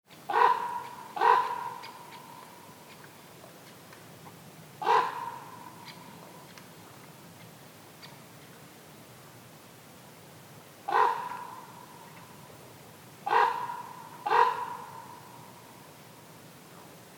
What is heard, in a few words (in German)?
Kolkrabe nah